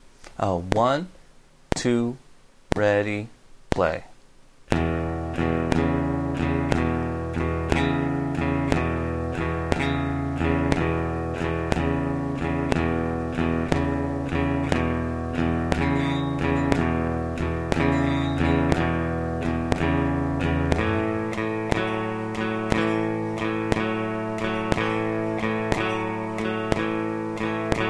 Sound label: blues in e5 e6 play along